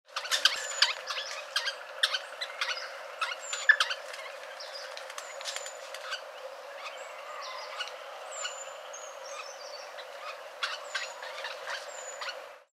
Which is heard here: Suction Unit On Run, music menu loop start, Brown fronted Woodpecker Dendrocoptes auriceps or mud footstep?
Brown fronted Woodpecker Dendrocoptes auriceps